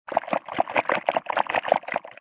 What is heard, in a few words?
Pet Eating